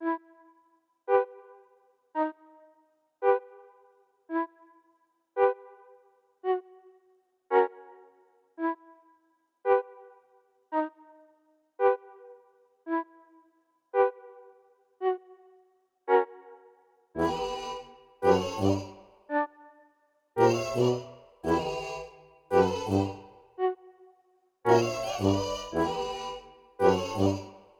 112bpm 144beats; Brock Phone-Edition

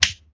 Lights switch On

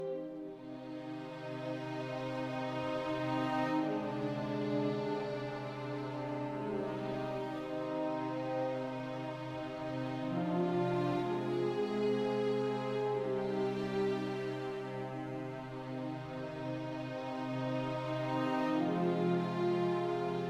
Music Segments Walk Big Cold 64bpm Fadein Loop; asterix obelix slap them all 2

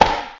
Peeing poke low

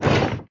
Tap treasure chest; Clickergame

